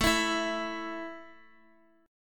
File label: A 5th A down Guitar; strummed